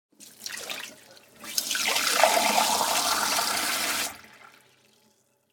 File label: filling a glass with water; sonniss gdc